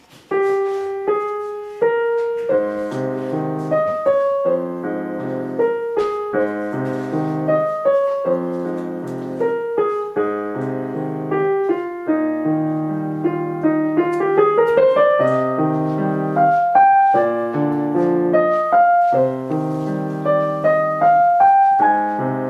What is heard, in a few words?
Meditation Piano